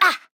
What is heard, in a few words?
Grunt Attack; Huntress